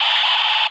Multiple two-way radio squelches and feedback.; Squelches, Radio Telemetry, Radio Static

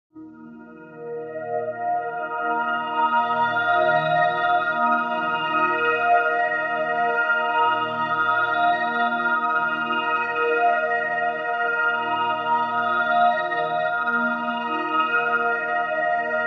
Bed Airy Daybreak Dro

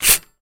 Typewriter Paper, typewriters

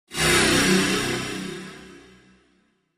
Elect Shimmer Lfe

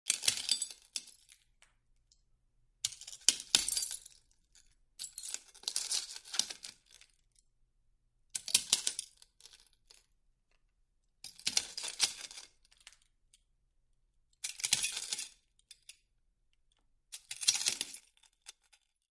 Glass Crush Scrape

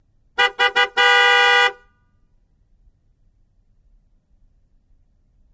Expedition Horn Honk, ford expedition

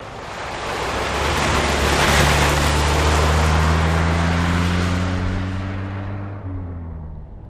Jeep Cherokee 84 Slw Pass

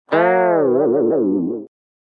Cartoon Effect Guita

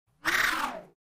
Short Synth Rising, synth tones

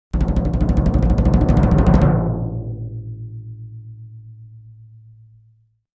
Drums Toms C Low Roll

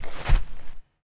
LARGE CREATURE FOOTSTEP, monsters

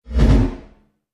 Bass Impact Double

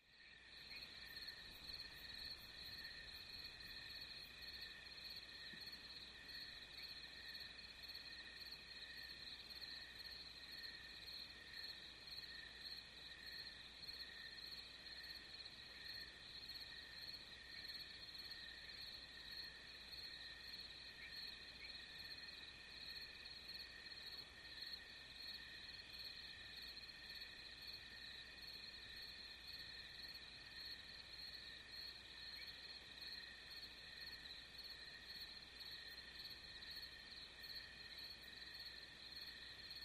Pretty Mid Day Field, crickets

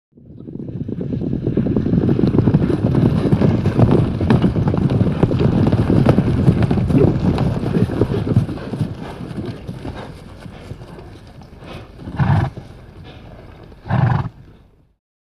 Horses Stop Massed

